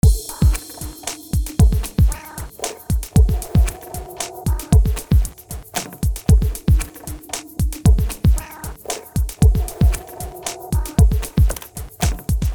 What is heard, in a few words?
snowfox perc